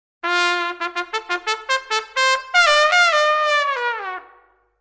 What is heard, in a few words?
broken trumpet